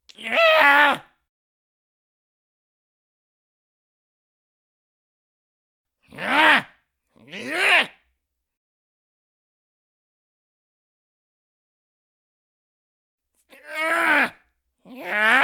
writhing; pegleg; Pitch Black - A Detective's Demise

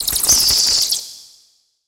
Bats Hit

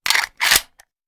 Hand Gun Foley; Sound Ideas; Guns

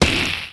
Melee Magical Light; Kilta

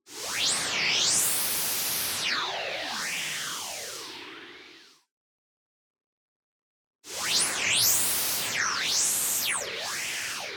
MOVEMENT LONG Filter White Noise Resonance; boom library; Anime Essentials

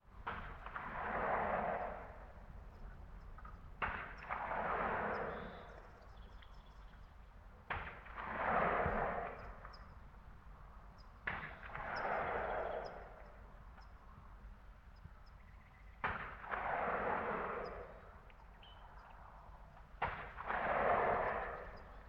War Ambience Gunfire; Sound Ideas; Guns

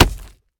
wall bank; firefight

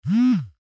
chat receive; Dice World

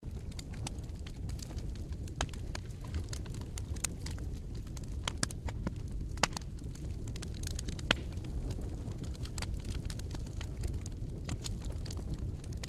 fire; Pitch Black - A Detective's Demise

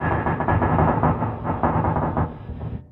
Creak Deep Wood; SFX Kit; Creaks, Scrapes, & Misc

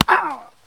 Male Hit By Pitch Baseball

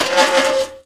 Valve Metal Wheel Turn Squeak; SFX Kit

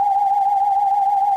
Sci-fi Hover Bot Loop; SFX Kit; Sci-Fi Loops

